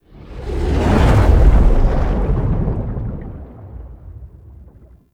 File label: Underwater Enter; Edens Ultimatum